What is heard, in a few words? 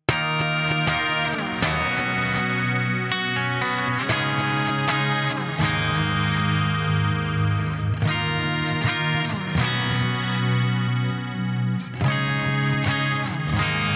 Riff Crystallizer